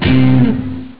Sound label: hitt; Amiga Soundtracker sample